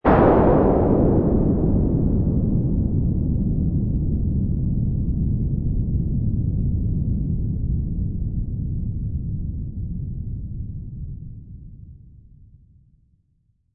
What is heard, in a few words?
Space Explosion, Distant explosion in space, long decay, with reverberation; Lynne Music